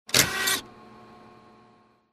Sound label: Printer Scanning Sound